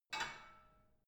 barbell only lift up soft, fitnessstudio

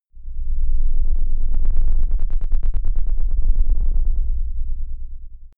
alien dark deep tension; aliens

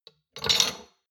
shot glasses grab multiple medium; berufe; gastronomie; soundpool

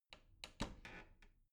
bathroom cabinet door open soft

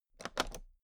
toolbox latch open regular

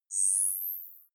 zoom out light high; kommunikation; signale

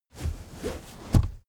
car armrest backseat open

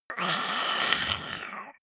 monster throaty assault; kreaturen

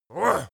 male attack growl

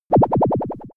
synthetic wobble; bewegung; comedy-cartoon; soundpool

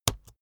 keyboard laptop enter press slow hard; kommunikation; computer

